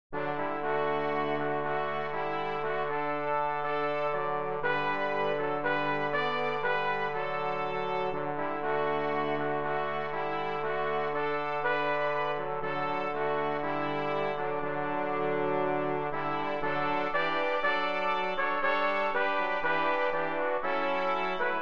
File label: church music